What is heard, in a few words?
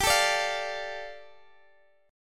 G 7th Suspended 2nd, Guitar DADGAD